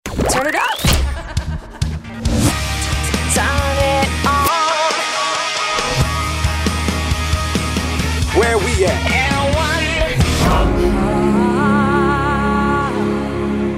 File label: Jingles